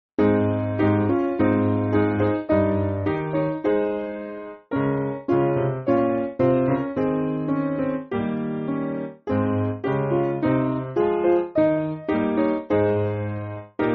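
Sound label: Piano, Music Backing Files for Churches; Saviour Blessed; Norfolk Park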